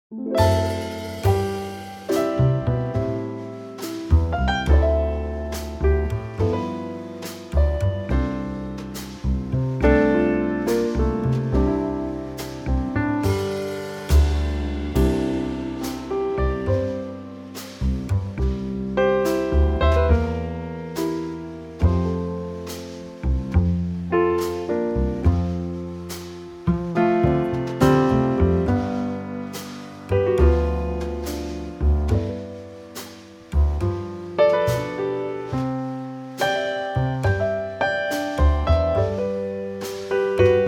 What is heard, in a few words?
C Trio